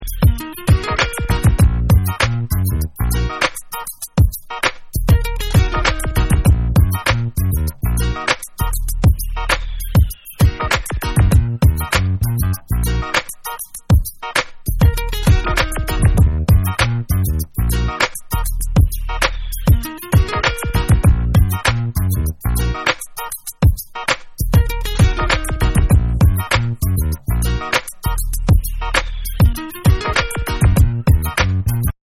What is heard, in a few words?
Instrumental